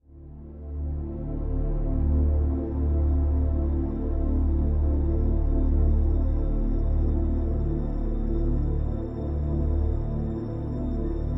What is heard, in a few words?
BGM ADV Silence; aster tatariqus android ios mobile gamerip 2023